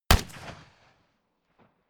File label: rifle ak-47 exterior fire single clean; comedy cartoon; pistolen gewehre; Pistols and guns